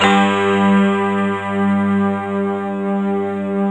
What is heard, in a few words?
YAMAHA FM; OTHER SYNTHS; Rubber Chicken Software Sample Bank